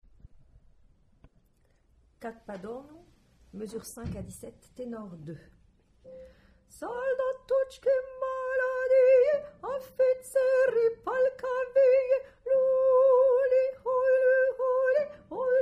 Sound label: Tenor